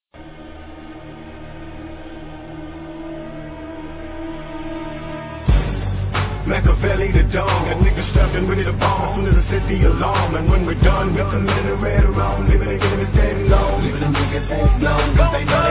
song

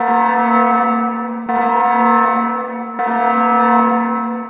alarm citizen loop

